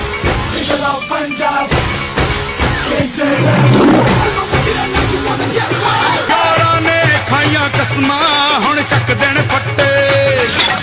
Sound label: Punjabi Song Ringtone; IPL 2018 KXIP